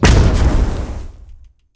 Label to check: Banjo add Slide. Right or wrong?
Wrong